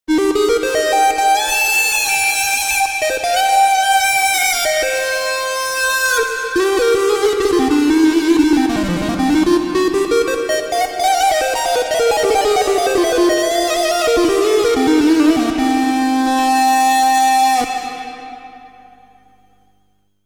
Korg T1 Disto Lead synth

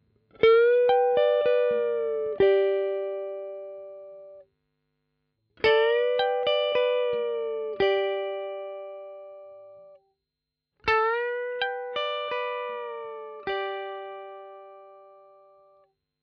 Chapman Blues Lick all positions

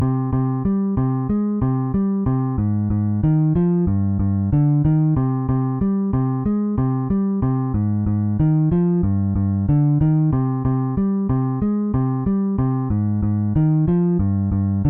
Bass; 4front

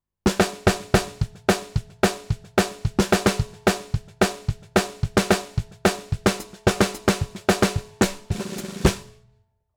Country

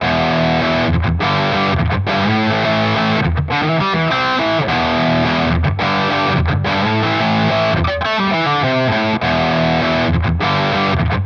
Nux ProCo Rat; Audiovergleich Mooer Nux

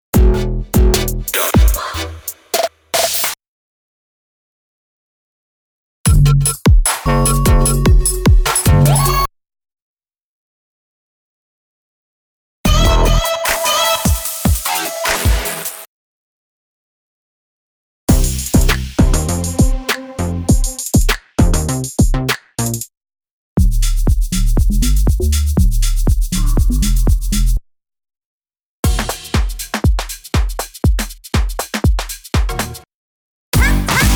Drumkit Loops

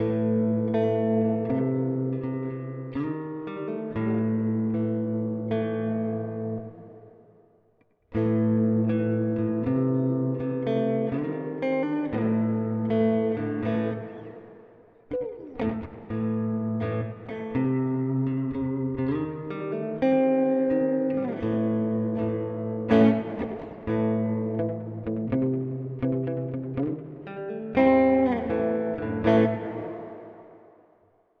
Hall 224; Golden Reverberator

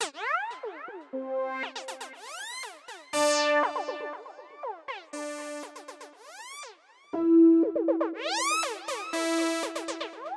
Boss SY 1000

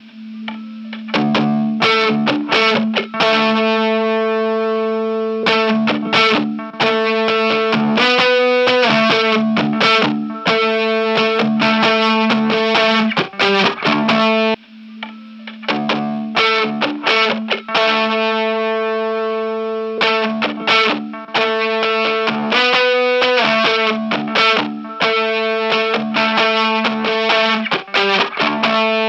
DRIVE GIT cleanup

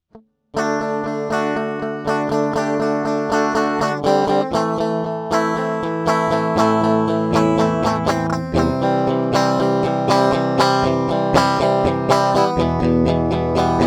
Strat; Arturia Audiofuse 8Pre

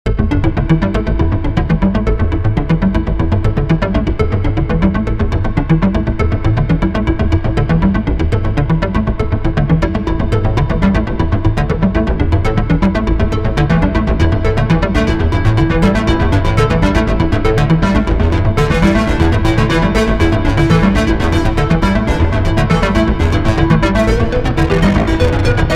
Sequential Prophet X Retro Future

